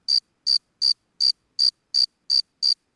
emitter cricket

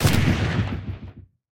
aa machine gun small lastshot

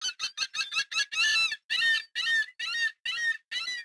seagull far; ambient birds; World of Tanks Blitz

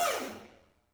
Aircrafts Cockpits Seat Adjustment End; DCS

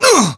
melee attack

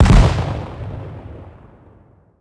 explosion close; World of Tanks Blitz

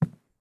walk wood; Escape from Tarkov

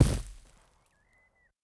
grass; Rust

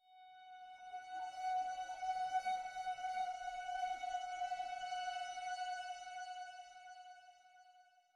music interactive; World of Tanks

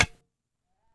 Staff Dirt; Civ4 warlords

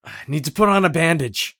bleed in; Escape from Tarkov

